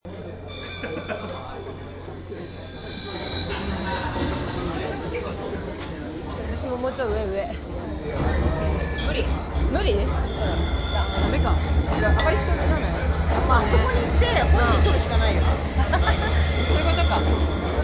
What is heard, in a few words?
electro